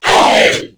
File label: stun; tera creatures; abomination guard